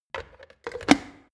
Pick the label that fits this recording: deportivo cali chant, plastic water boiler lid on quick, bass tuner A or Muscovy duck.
plastic water boiler lid on quick